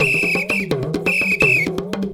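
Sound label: tama foudang groove; Best Service; World Colours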